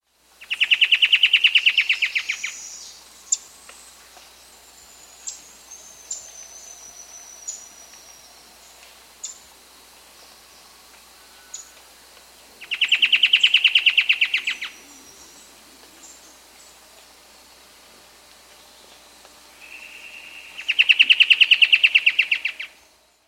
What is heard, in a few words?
Roraiman Antwren, S. America